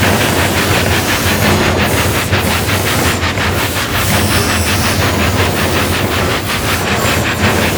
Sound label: Reactor Overheat Loop; Missions; Railjack; Volatile